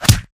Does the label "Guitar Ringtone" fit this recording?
No